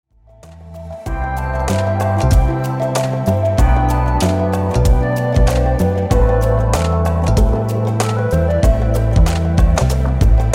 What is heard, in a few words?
Lounge